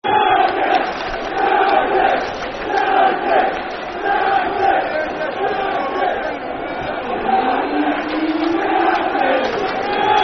Clap, Football Chant (Soccer Song); United (Clap); Peterborough United Pufc

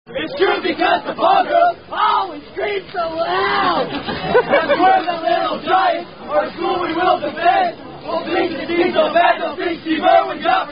Unknown Fraternity Chant

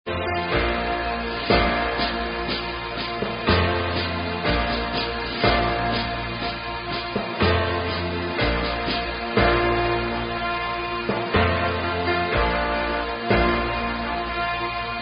Orchestra, Karaoke Songs; Girl Scouts

